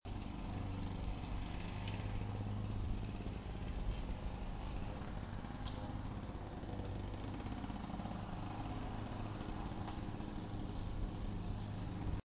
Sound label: the sounds of saturday